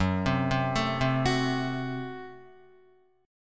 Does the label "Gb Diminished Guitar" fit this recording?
Yes